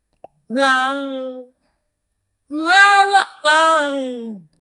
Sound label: a chest is opening and